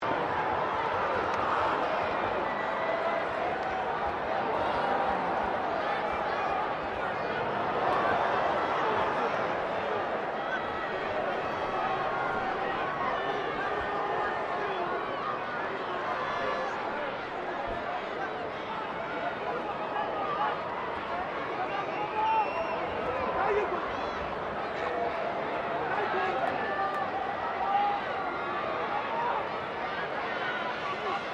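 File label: crowd 15K Rugby World Cup ambience medium loop, Rugby world cup game; Crowd Sound Effects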